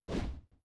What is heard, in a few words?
jump